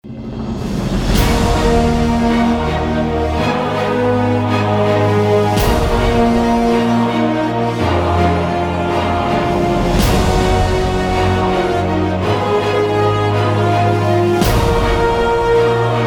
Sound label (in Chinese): 大气的, 背景音乐